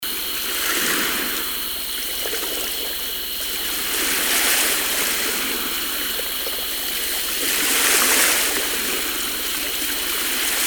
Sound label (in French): Mer tres calme ete